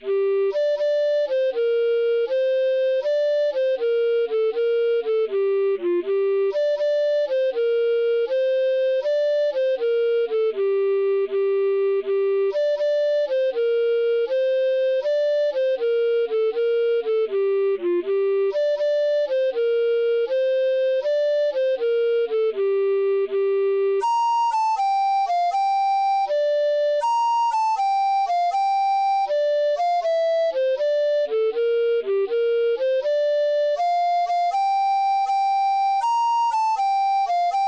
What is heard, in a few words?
Female Sailor, alto recorder